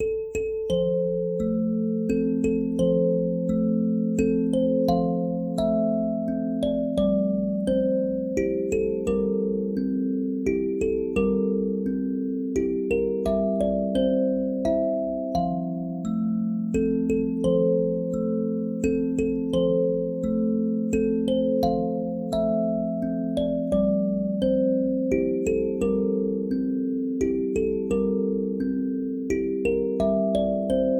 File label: Lullaby